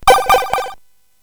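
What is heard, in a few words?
Nebulus, MOS 8580 SID chip music, games; CSG8580R5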